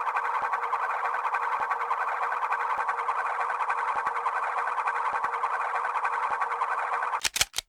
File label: gun spin full; knightley